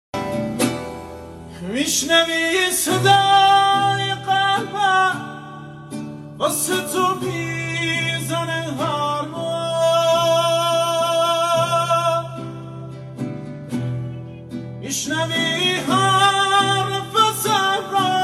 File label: remix guitar